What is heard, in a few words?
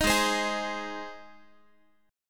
Ab Major Ab down Guitar; 18,16,15,18,x,x; Ab strummed